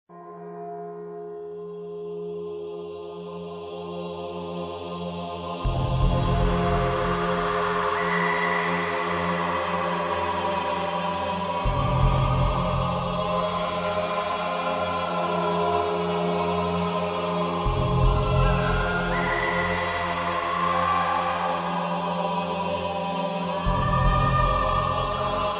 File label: death, music; Daikatana; Micromanía 65